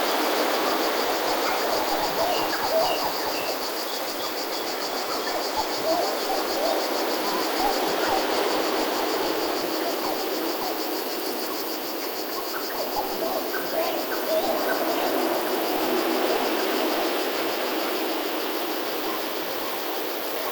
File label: Apartment Ambience Loop; Warframe; The New War; Zariman; Scene Six